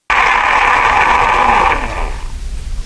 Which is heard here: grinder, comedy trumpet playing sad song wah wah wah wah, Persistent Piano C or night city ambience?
grinder